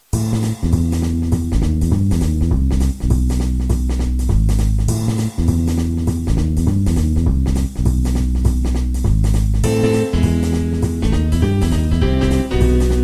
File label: music tavern